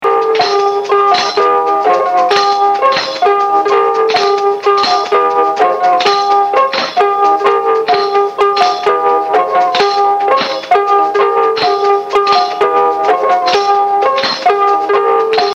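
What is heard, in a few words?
ukulele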